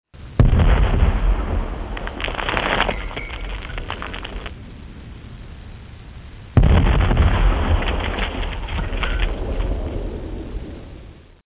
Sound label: 10-Pounder With Canister